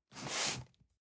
cardboard box medium slide short; objekte

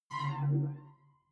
sci-fi visor clean; krieg-waffen; soundpool

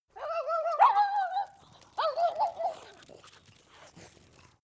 dogs chase bark; natur-tiere; hunde

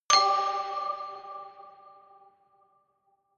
notification glockenspiel creepy fifth

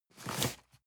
cardboard box medium slide short hard; objekte

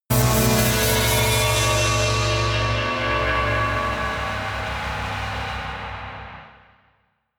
synth slice

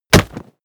body fall concrete dry; nahkampf; soundpool

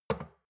shot glass place table soft; berufe; gastronomie; soundpool

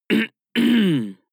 harrumph male; koerpergeraeusche; menschen; soundpool

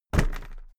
briefcase plastic drop; industrie wirtschaft; bueroarbeit

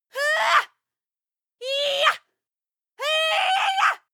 female war cry short

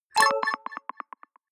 notification signal

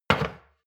shot glass place table slide hard; berufe; gastronomie; soundpool

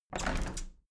window handle plastic squeak open regular; objekte; fenster

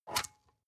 storage lid slide open hard; objekte

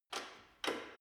gym bicycle saddle unlock soft

